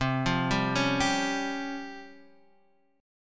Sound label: C sharp Major 7th Flat 5th C